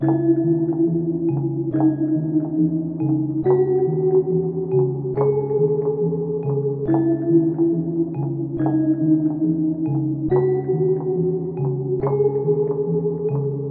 Melody Loop B 140 BPM C# Min; Cymatics

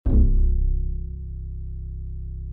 Bass INTRO

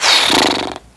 Fart

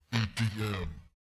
EDM, Vox

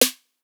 Sn, Snares

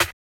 Open Snares

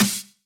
SNARE

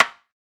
rim

